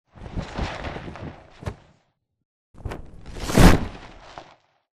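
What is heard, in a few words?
flyer dropship attack medium distance; boneyard; Titanfall 2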